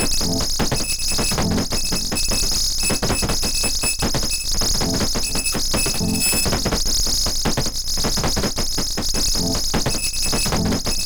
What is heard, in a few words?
corporate medical test waiting